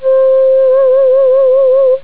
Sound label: flute mod; Amiga Soundtracker Sample Packs